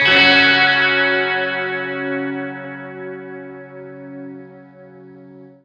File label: Lake Clean F sharp 3; Torchlight III; PS4